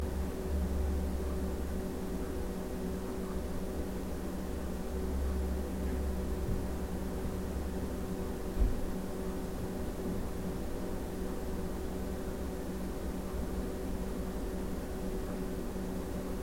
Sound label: footsteps